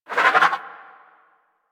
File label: Bird Captured Idle; Warframe; Hunting Critters; Sawgaw